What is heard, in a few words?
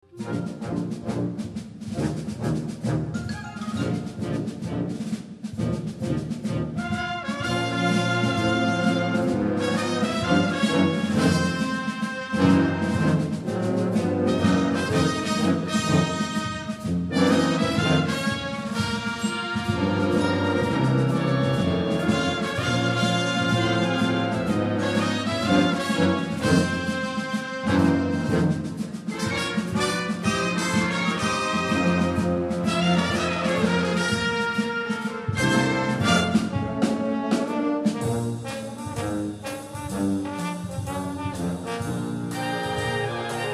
american jazz